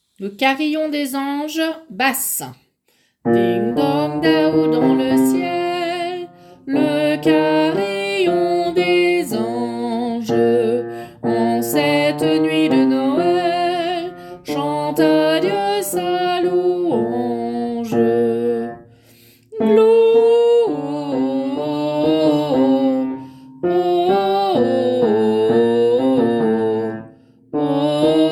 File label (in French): Carillon basses